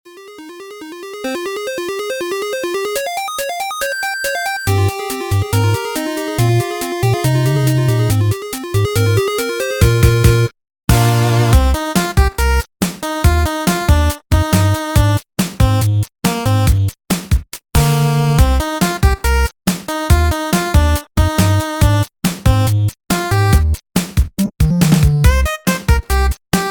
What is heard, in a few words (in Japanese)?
8ビット風BGM; Lyria 3; Gemini